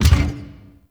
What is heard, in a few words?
Gear Shift Down, Motorcycle; Warframe; Vehicles; Motorcycle Ninety Nine